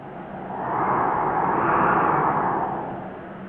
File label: wind gust mono